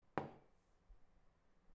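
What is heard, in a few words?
Bottle Being Put Down On Table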